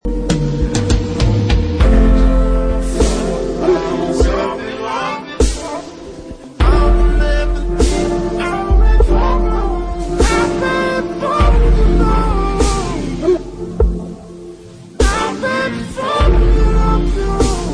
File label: boys choir